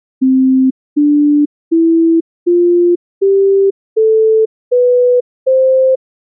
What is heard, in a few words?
pure tone do re mi